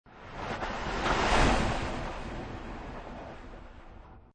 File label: Skiing Snowboarding Snowboarder Or Skier Pass By Fast Left To Right